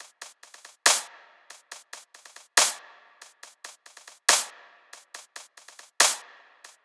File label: Key Ultrasnare